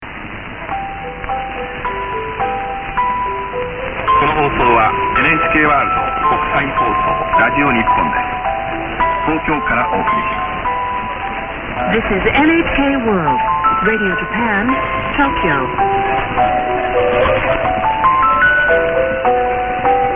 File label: interval signal short; nhk